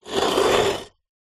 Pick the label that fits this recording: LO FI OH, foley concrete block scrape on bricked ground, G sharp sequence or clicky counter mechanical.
foley concrete block scrape on bricked ground